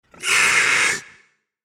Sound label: Squeal; sound design; Maneater Boar Squeal